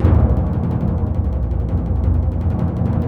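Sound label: timpani C#, Synclavier Percussion; ILIO; Synclavier Percussion 2; World & Orchestral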